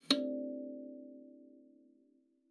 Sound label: KALIMBA D5; Xsample VOL-7